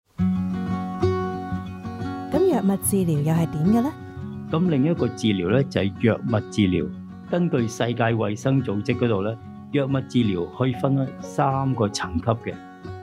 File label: music